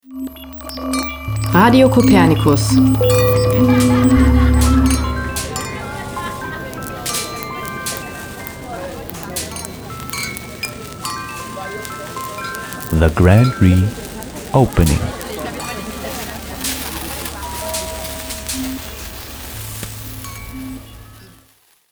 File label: Jingle Grand RE opening